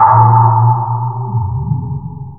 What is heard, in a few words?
PERC FX, Big Beat; Big Beat Samples & More; AKAI